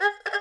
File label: ricochet, erhu; playing tech